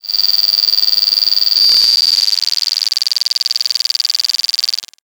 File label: Digital Buzzing Rattle Glitch